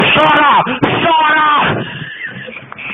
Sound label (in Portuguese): chora